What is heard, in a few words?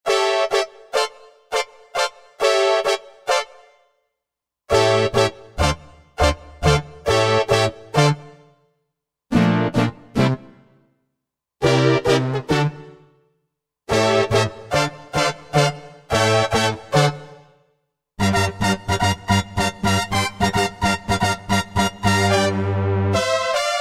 Stabby Horns, Korg Wavestation A-D